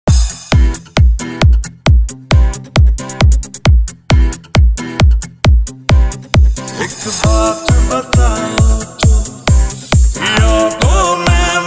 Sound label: Club House Remix Song